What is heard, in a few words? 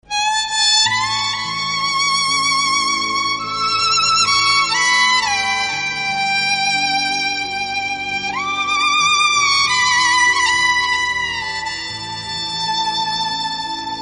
classical cool violin music tone